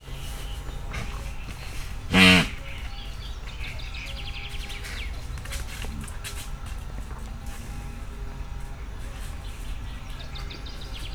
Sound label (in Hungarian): guanakó láma; szegedi zoo 2011